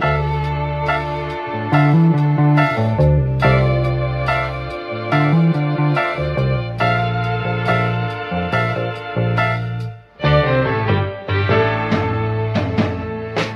strings piano bass horns, loops, phonky

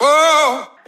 "woah" SUNG

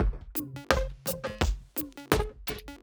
blippy, Electronic Loops

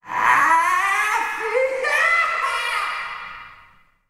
laugh witch distant; ooh ahh

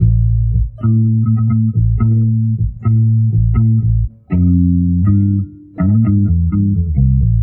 bass honeybear, Loops, Phonky

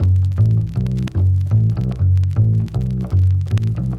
bass, Loops

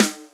Snares Real

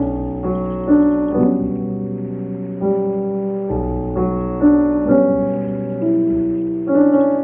piano, Loops Sad

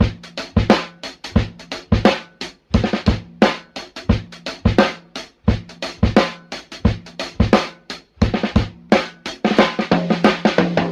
sloppy funk, drum loops

